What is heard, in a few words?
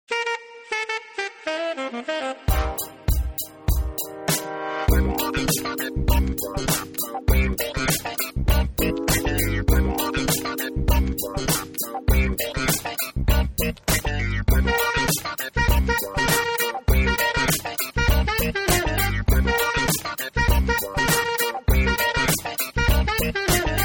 70s funk